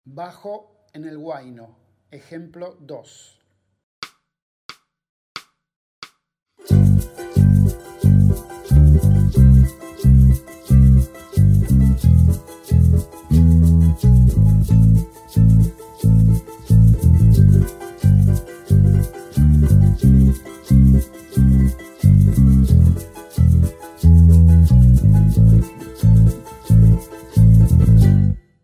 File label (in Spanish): Bajo en el huayno